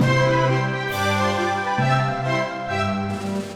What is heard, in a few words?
classic, ringtones; Google; NewWave Labs